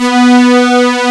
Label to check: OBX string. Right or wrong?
Right